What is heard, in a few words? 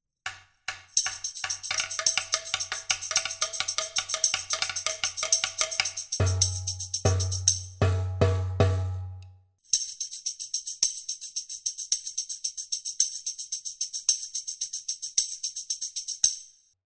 percussion; MegaEpix Enormous